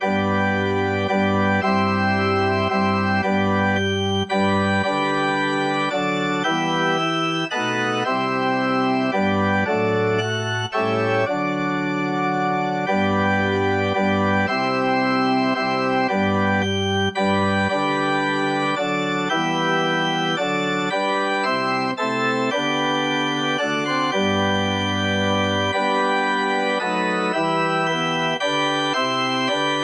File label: blaenwern organ